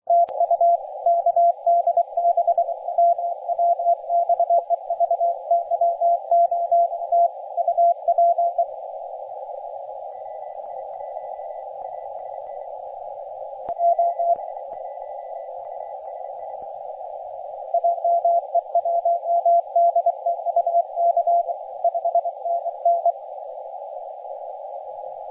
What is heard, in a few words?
18.070 MHz cw